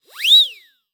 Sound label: Cartoon Whistle Motor Whistle; AdobeCC